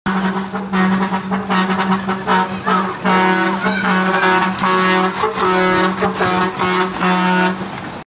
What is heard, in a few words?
trailer truck horn